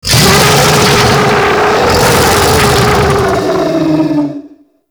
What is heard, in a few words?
Vessel Close Scream; Warframe; Tau; Sentient Vessel